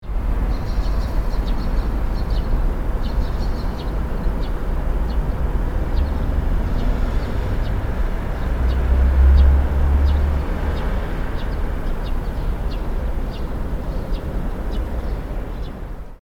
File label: town morning